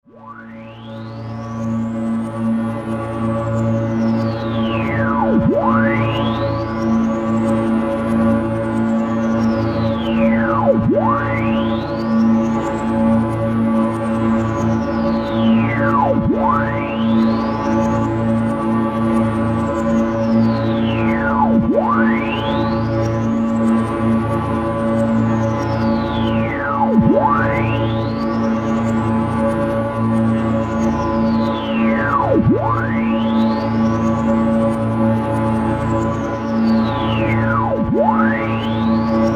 Bed Phaser Music Element; Bed Sound Effects

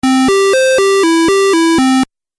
Game Music Phrase; Video Game Sound Effects

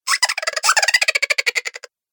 Chipmunk Laughter; Cartoon Voice Sound Effects; Chipmunk Laughter 03

